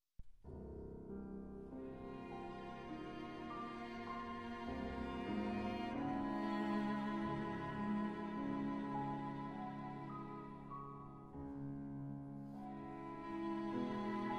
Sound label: Trio